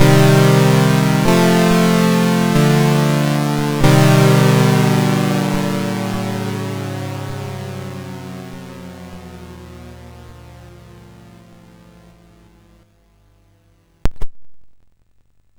sid MOS6581R4; Thunder Hawk

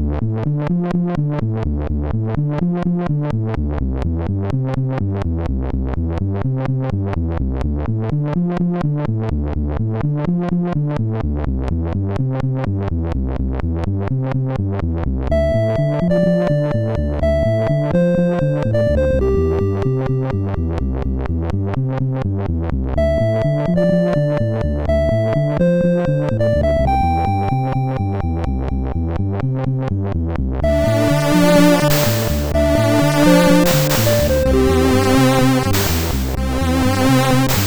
sid, MOS6581R4; Note Editor

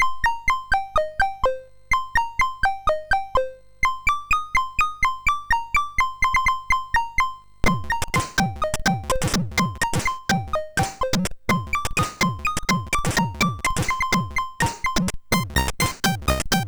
Confused, SID MOS6581R3

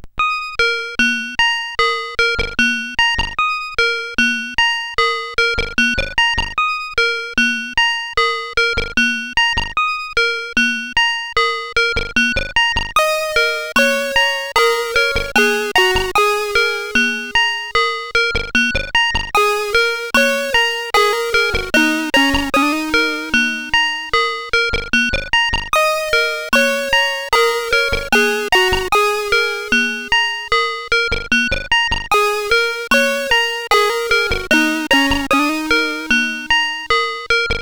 sid CSG8580R5

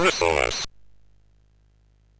sid MOS6581R2; Cave of the Word Wizard

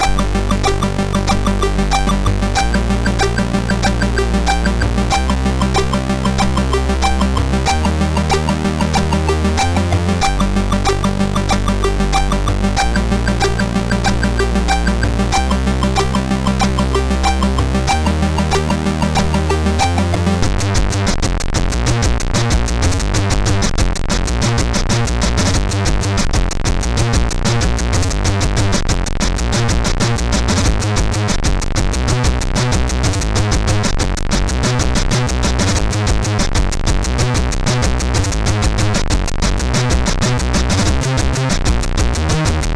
Drumtakt, MOS6581R3

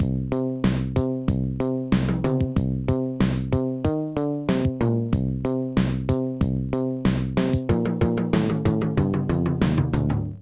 Kit; Musicraft

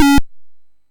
sid MOS6581R4; Frogger II-Three Deep

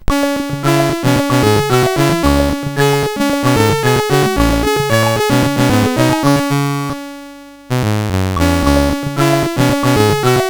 Intro sid CSG8580R5; Crackman

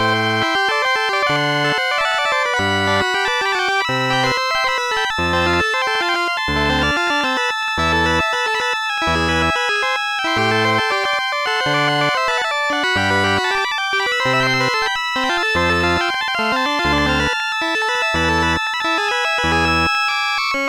le grand waltz; Instant Music

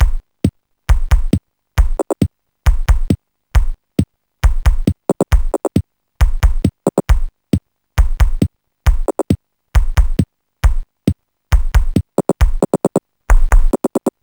drum; Sonix Music Driver

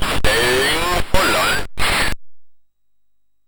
sid MOS6581R3; Out on a Limb

